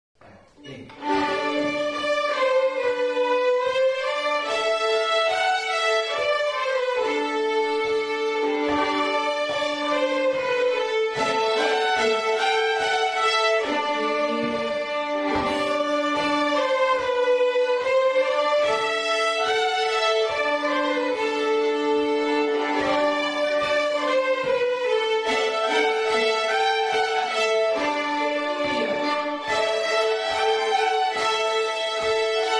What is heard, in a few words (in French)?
Marche, violons; tous ensemble